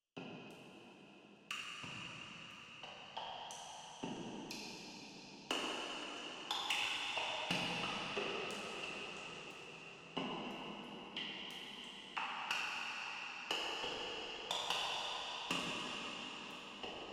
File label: Rain; SoundShaper; StiX